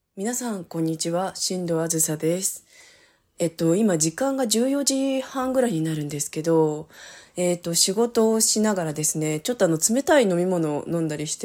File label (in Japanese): おしっこ実演, 普段のおしっこ